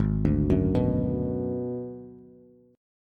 Ab Minor 7th Flat 5th Ab, down, Bass; arpeggiated; JGuitar

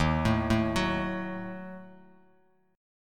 Eb Diminished, Bass Standard; Ebdim arpeggiated; 11,12,7,11; JGuitar

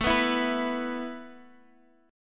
A Suspended 2nd A

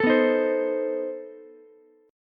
B 7th Flat 9th B down Ukulele C6; B7b9 strummed; chord shape; JGuitar

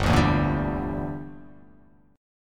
G Minor 6th; Gm6 strummed; JGuitar

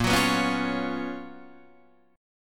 Gb Major 11th

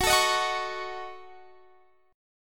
D sharp Minor 7th Flat 5th F sharp

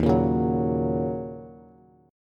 Eb Minor 7th, down, Bass; strummed; chord; JGuitar

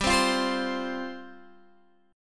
G 7th Suspended 4th G down Guitar Open G

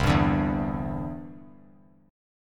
C Major Add 9th, chord; strummed

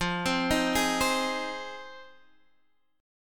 F Minor 7th F; arpeggiated

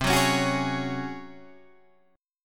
Db Major 7th Suspended 4th C

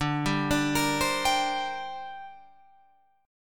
D 7th Suspended 4th Sharp 5th down Guitar Open F; D7sus4#5; arpeggiated

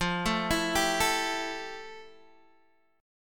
F Major 9th F, Guitar, Open D

